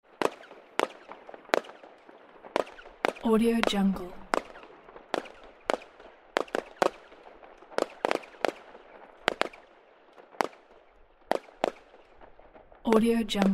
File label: distant fireworks in the city